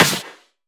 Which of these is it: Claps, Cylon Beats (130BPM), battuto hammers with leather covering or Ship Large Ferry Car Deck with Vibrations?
Claps